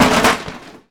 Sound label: Book Drop Metal; SFX Kit; Foley - Misc